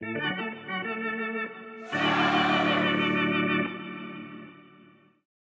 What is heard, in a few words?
organ charge